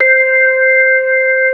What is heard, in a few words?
C5, Keyboards Old School; USB Soundscan vol.09; AKAI; SMALL B3